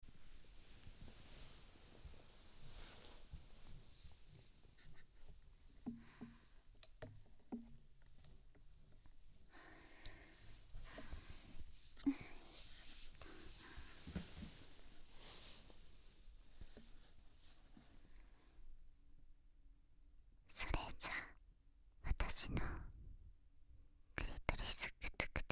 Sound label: ASMR; クリオナ